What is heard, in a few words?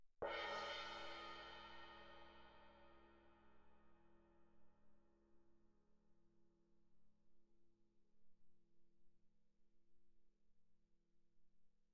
crash mallet mf, suspended cymbals; Percussion